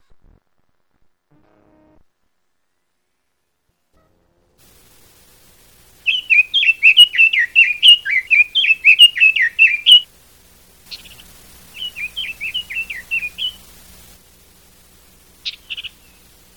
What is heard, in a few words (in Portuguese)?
Rouxinol do Japao